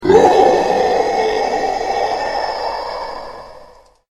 alert; slender; team killer

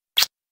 kiss; boykisser

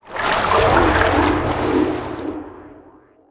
alert; bendy

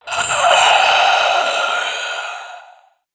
female zombie sighted; nmrih; zerosiki; slender

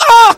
pain sharp; voice packs; scout

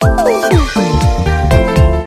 victory; tf2ware ultimate; music game; wii penny

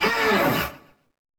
stun; slender; roblox; coolkidd; princess

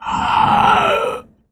female zombie attack; nmrih; zerosiki; slender

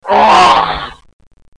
attack; cof

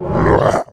attack, male zombie; nmrih; zerosiki; slender

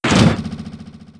kart collide vehicle; tf2ware ultimate; mariokart

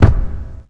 granny footsteps; zerosiki; slender

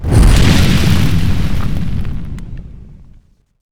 despawn; forestkeeper; lethalcompany; zerosiki; slender

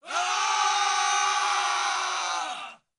battle cry; zombiesurvival

